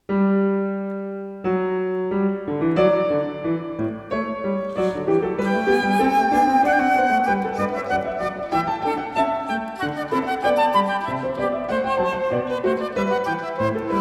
Trio Sonata in G, Presto, Played On Piano And Flute; J.S. Bach; BWV 1038 Anh.II 154-4